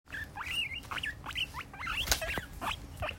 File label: tickle, レムはくすぐられると2 kHz以上の声で鳴いたり500 Hz程度の声で唸ったりします。; 体をくねらせ足も頻繁に空を描いているので苦しそうに見えます。; 0歳9ヶ月27日; guinea pig; くすぐられている時のレムの音声